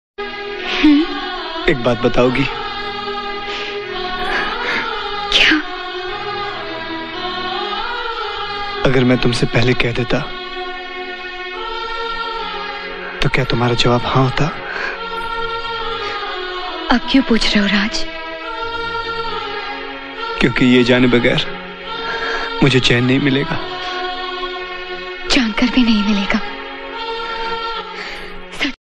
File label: hindi song ringtone